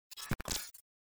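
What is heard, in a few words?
signal glitch; افکت صوتی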